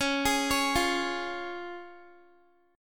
Db Major Db Guitar; arpeggiated; x,16,18,18,17,x

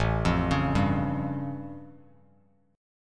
A Augmented 7th A Bass Standard

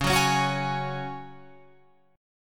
Db Suspended 4th, Guitar, Open F; strummed; 13,13,13,13,16,15

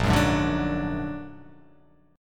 Bb Augmented 7th Bb down, chord; Bb+7; strummed; JGuitar

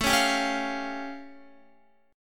A Augmented 7th A Guitar; x,12,13,12,13,13; A+7 strummed

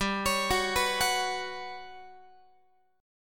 G Major 7th Flat 5th, Guitar; GM7b5; arpeggiated; x,15,16,16,15,17

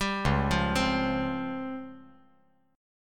Db Major 7th Flat 5th; 0,1,1,3; DbM7b5 arpeggiated; JGuitar

